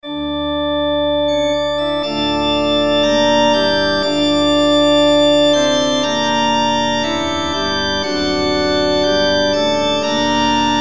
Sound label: Plein Jeu; Marchand